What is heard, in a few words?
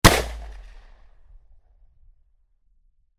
308 Bolt Action Rifle Single Close Gunshot; fesliyanstudios